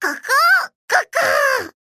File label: flame